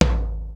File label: Yamaha RX-5, drums perc